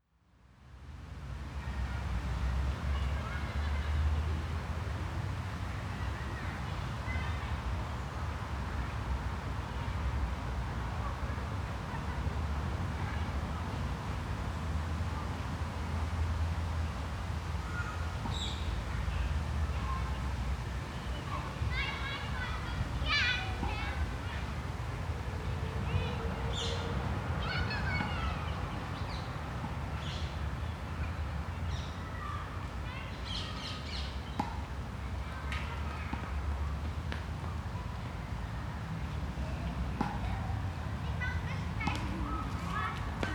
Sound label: park children play traffic